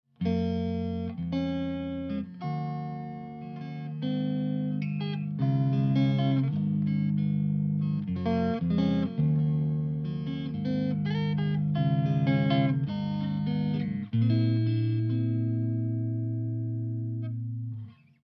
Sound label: arpeggio, guitar amp